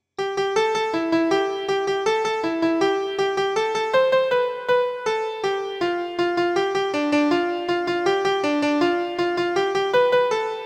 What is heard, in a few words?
dance 80 bpm